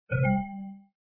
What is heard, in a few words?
Windows XP Hardware Insert